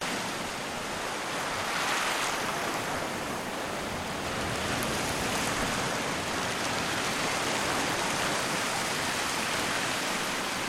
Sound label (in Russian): zvukovoj effekt plyazh